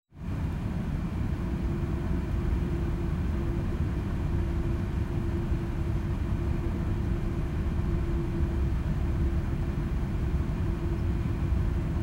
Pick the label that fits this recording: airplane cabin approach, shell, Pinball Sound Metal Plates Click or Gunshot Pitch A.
airplane cabin approach